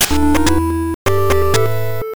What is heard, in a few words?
Startup; virtual boy; V-Tetris